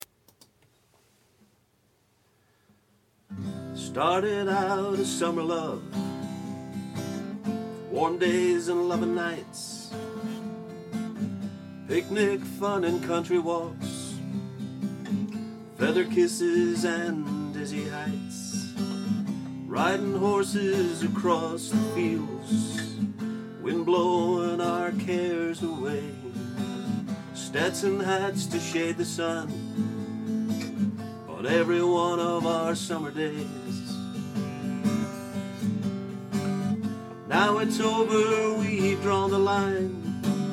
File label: rough country song